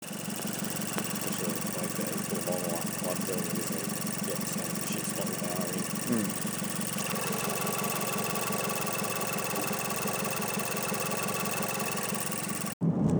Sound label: mazda cx7 ticking noise